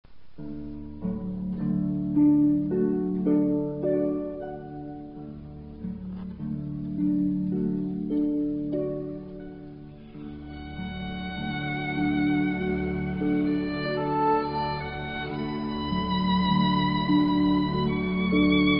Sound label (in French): violon; massenet